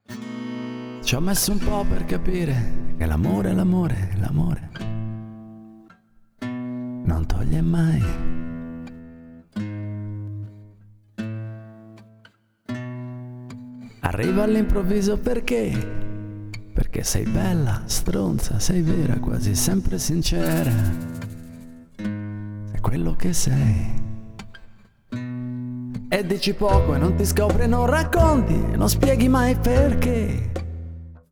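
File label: Male Vocals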